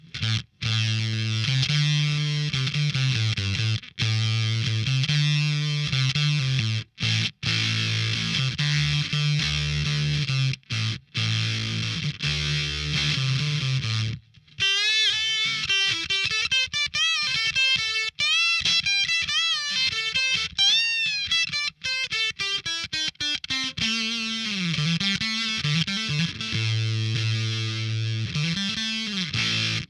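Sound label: Tone Change Tele, fuzz pedal, played on a Squier Tele; ARIA/DIAMOND FUZZ(IBANEZ STANDARD FUZZ)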